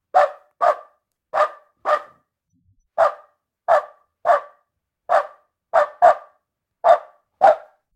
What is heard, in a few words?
dog barking energet